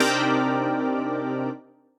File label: Big Organ, Synth Lead; Ableton; Skitter and Step